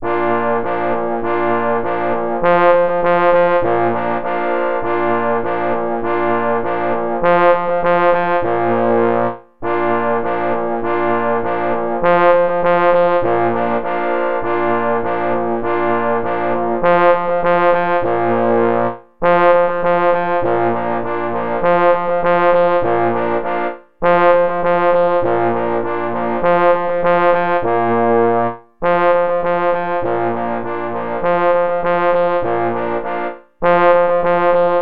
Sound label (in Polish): na 4 parforsy; Posokowce gora